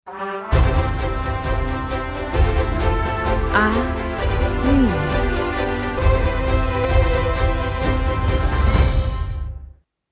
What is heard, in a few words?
instrumental